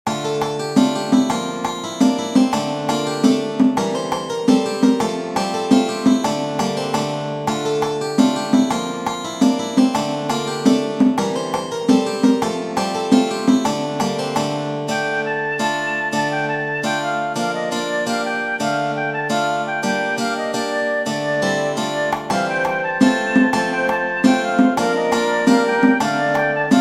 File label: racenica